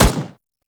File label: Turret First Shot; weapons; Lasrian APC; Warframe